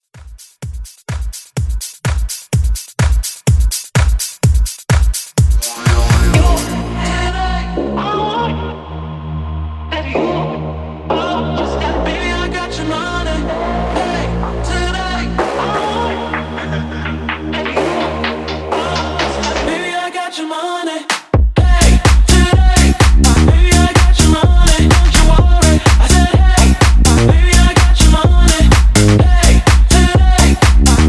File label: Future House Redrum; Remixfreakz